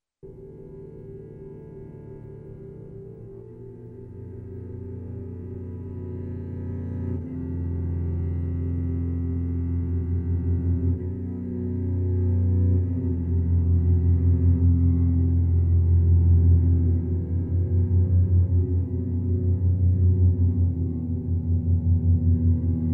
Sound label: Cello Choral